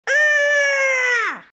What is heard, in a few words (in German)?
kampfschrei